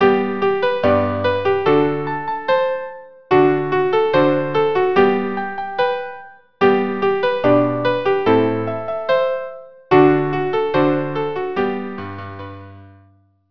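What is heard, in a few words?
violin piano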